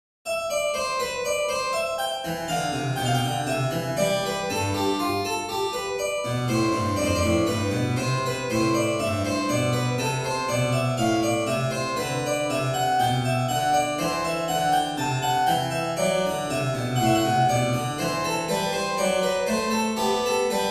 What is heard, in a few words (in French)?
invention en do majeur; Chopin